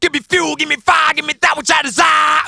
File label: fuel fire